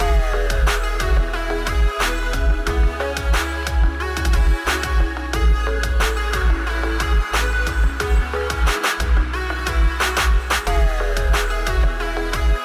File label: Slow quiet music